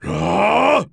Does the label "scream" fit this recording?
Yes